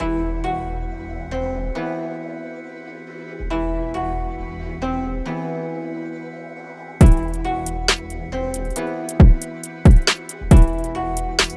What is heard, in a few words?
beat